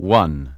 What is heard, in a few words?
VOX GENERAL; AMG Megabass REMIX! VOL-2